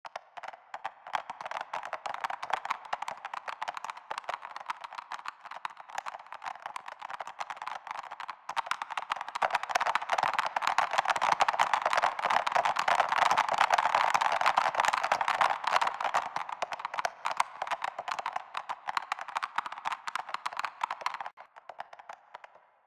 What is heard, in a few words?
crowd